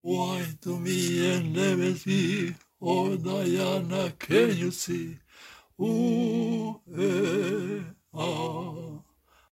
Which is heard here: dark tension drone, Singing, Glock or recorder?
Singing